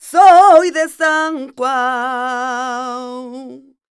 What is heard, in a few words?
voc c#, Flamenco Female Cantaora; Best Service ProSamples vol.46 - Flamenco; Vocals; Female Cantaora 1